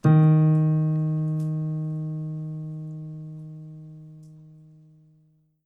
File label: piano sample